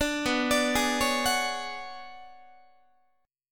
B Minor 6th Add 9th B down Guitar 4A 4E 5A 5D 5G sharp 6C sharp; Bm6add9 arpeggiated; JGuitar